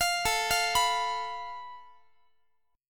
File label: A Suspended 2nd Sharp 5th, Guitar; arpeggiated; JGuitar